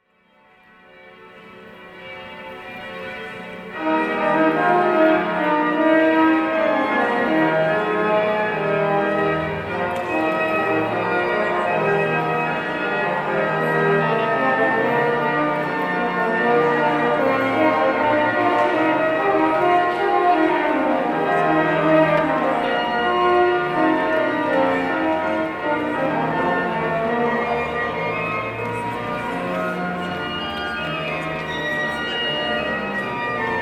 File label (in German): Horn Orgel Ausgang